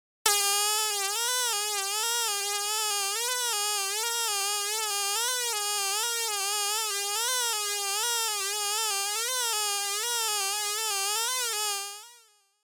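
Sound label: fly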